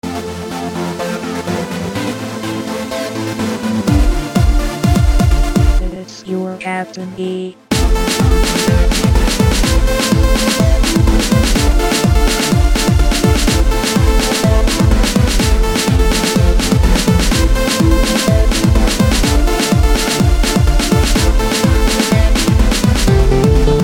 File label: funky sample